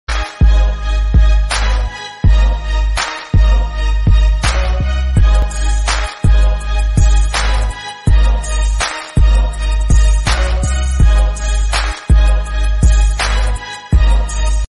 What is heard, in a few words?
bgm ringtone love ringtone couple ringtone